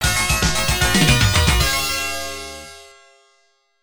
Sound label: Extra Fanfare; soldam arcade